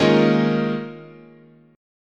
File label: Eb 9th Suspended 4th, chord